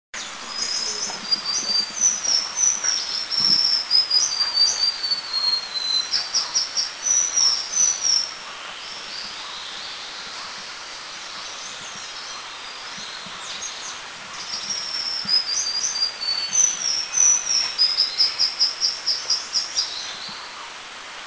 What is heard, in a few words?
White-gorgeted Flycatcher, Eaglenest, India; song